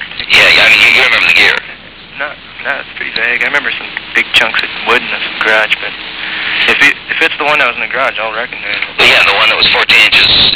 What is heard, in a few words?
Wooden Gear